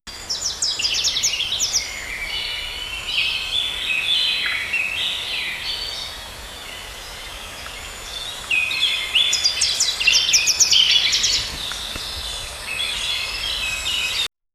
the sounds of a dense, animals

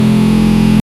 clean medium rev engine, car

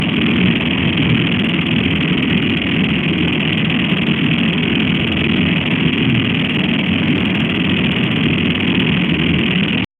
low subtle digital glitch; cyber

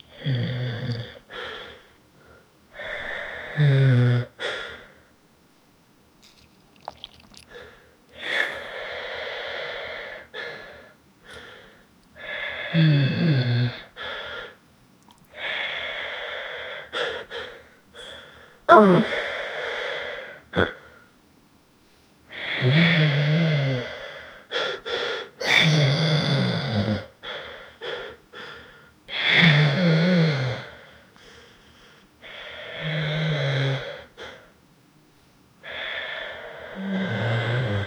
18 years old man holding

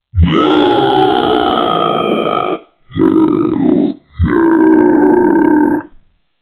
orc death sound

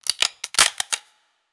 realistic glock 19 reload

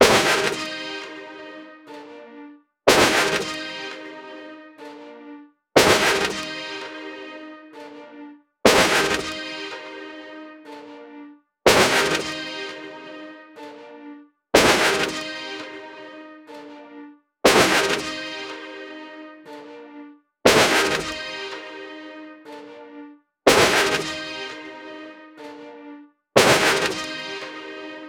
military aviation patrol, brass stabs

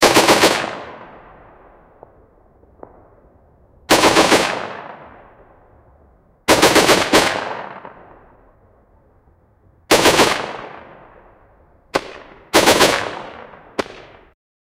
75mm gun from world war; artillery

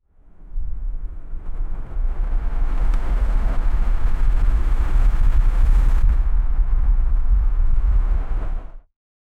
sound of many tentacles m

